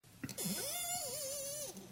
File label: squeaky microphone arm